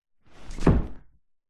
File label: wood door internal close sealed gentle